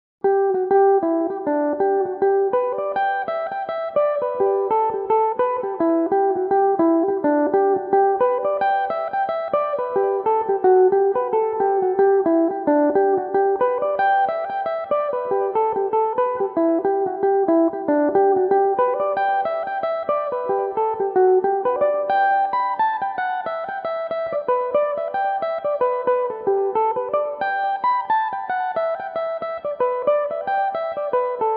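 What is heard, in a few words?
G major, mandolin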